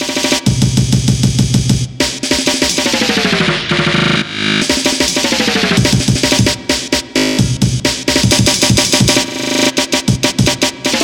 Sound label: Insane Break